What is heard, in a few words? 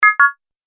Multimedia Completed, beep; Beep Sound Effects